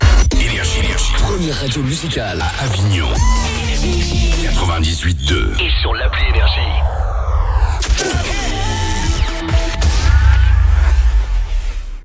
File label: JINGLE